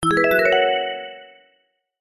multimedia alert musical warm finish complete success